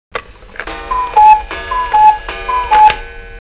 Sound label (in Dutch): koekoek lang